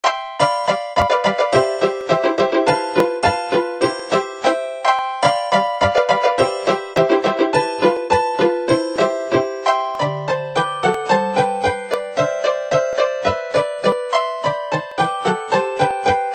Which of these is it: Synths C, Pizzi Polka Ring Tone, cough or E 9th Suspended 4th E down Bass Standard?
Pizzi Polka Ring Tone